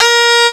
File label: FAIRLIGHT CMI IIX, STRINGS